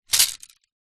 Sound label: tent pole folded down on grass; foley